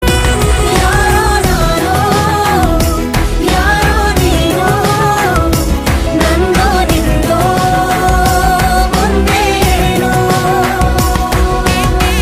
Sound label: Humming Ringtone